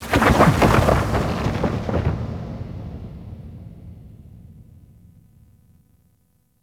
Thunder Large; Warframe; Ambience; Cephalon

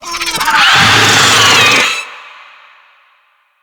Infested Ancient Disruptor Call; Enemies

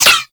ricochet; Warframe; Cowgirl

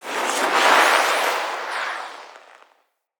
Siege Proj Whoosh; Level Events; Midrath; Warsong Prologue

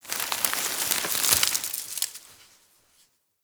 Forest Branch Snap Crunch; Warframe; Grineer

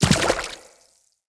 Infested Medium Land Water, Footsteps

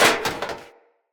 Battle Dog Helmet Destroy Chunk; Snc Battle Dog; Foley

